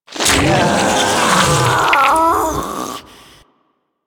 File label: Attack, Infested; Barks; Warframe; Ancient Shell Agent